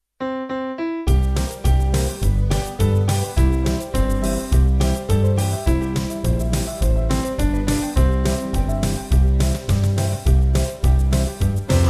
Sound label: Vocals Band; I Have Decided; Assam; 4/C-Db Vocals and Band